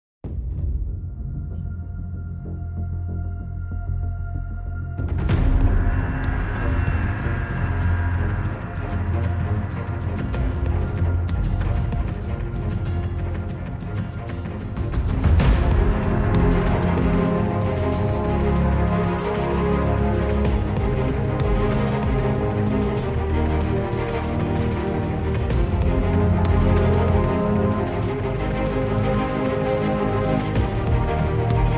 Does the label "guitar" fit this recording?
Yes